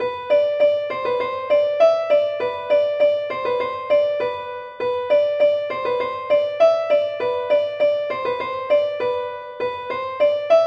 rond

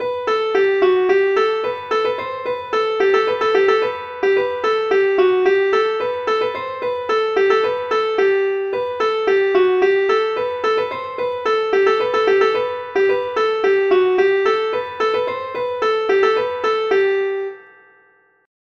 suite polkas

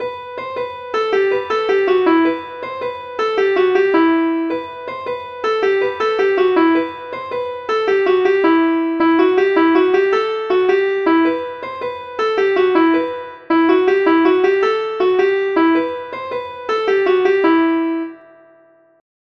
derobee guingamp

